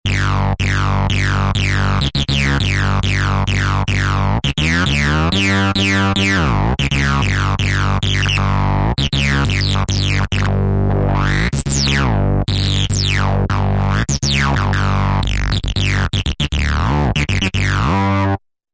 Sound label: TB Acid Bs; Factory Patches